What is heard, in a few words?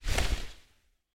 open wings; soundscrate